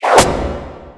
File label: starfall impact; speaker woman pack